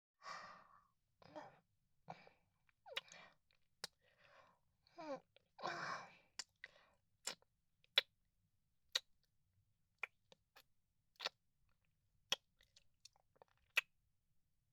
キス; SEなし

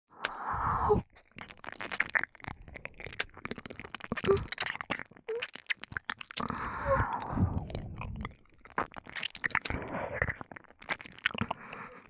(左) ねっとりしゃぶりつき耳舐めエッチ, ASMR; 白耳; パンパン系効果音版

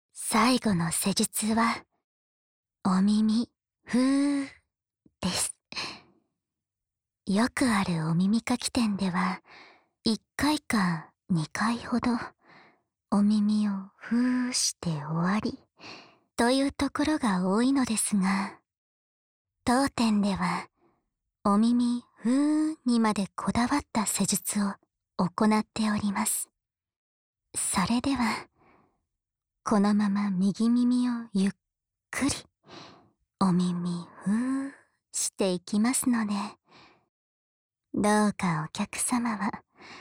艶麗な 本気の右のお耳ふー, ASMR

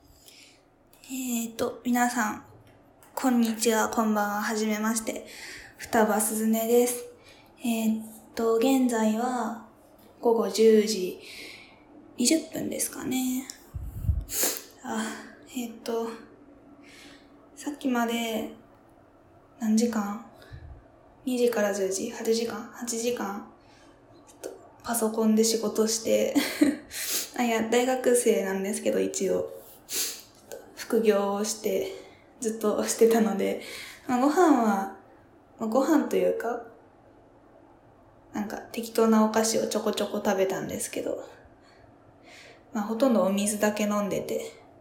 おしっこ実演, 紙コップにおしっこ編

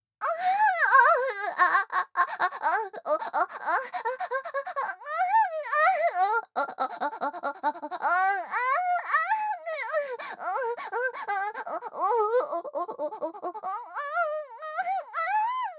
喘ぎ声・激しい・正面下

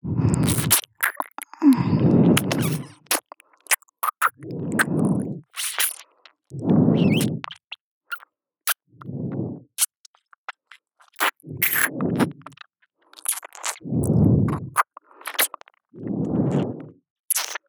右耳舐め (ループ); メイド様; SEあり

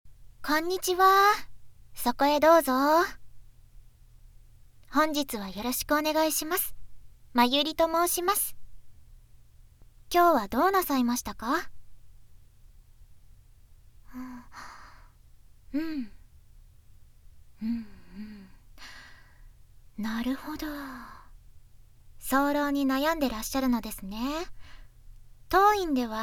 手コキ寸止め; 治療

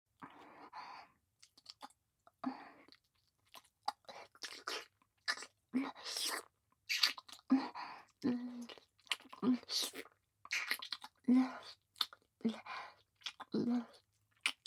フェラ_右

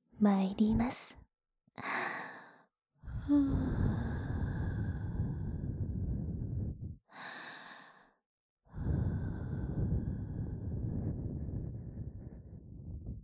【右】耳ふー, ASMR; 耳かき小分けトラック

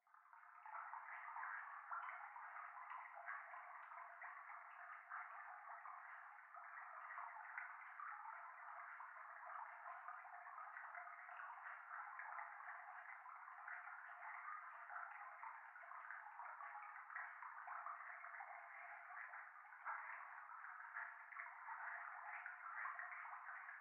教会 フェラチオ 川のせせらぎ

